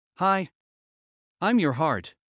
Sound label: Heart; Classroom humanbody